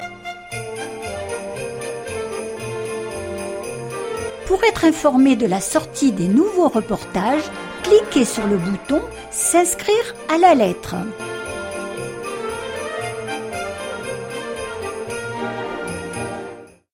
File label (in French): traineau